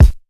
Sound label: warm bass drum one shot D# key; kick drum